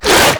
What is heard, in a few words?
Infested Runner Arm Attack G; Enemies; Abilities; Warframe; Proto Infested